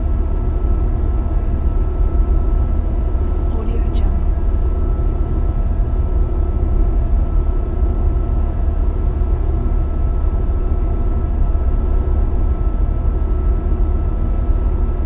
large ferry corridor interior idle loop

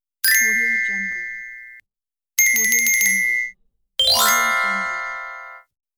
glock

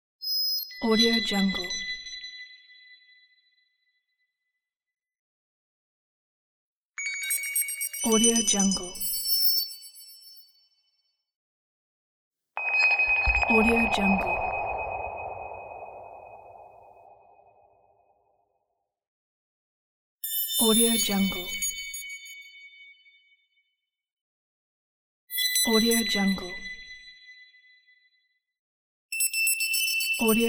space beep

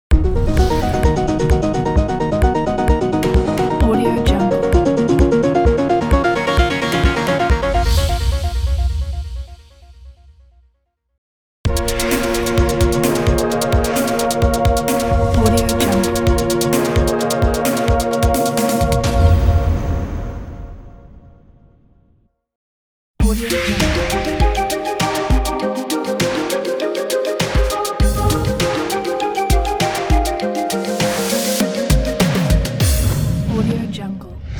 podcast intro logo pack; kipaloops

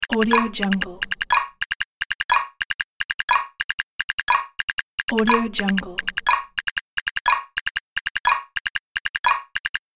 counter

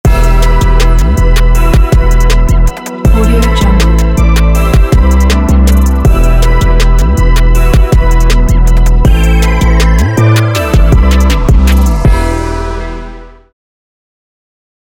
bright hiphop logo

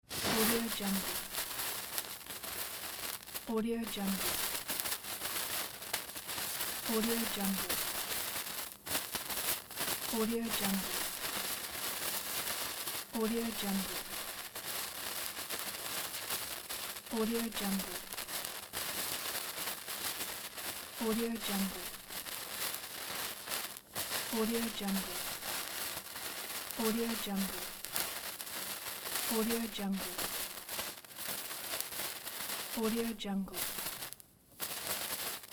sparkler fireworks burning

